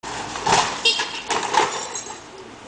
buzina

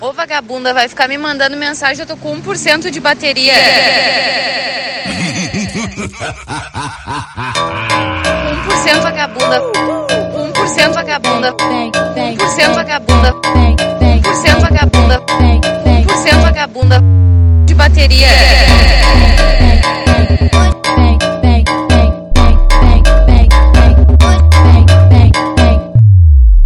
bateria

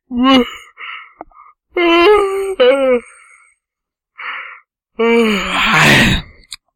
bocejo

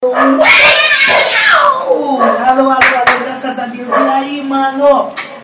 grito da pantera

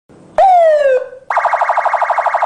sirene

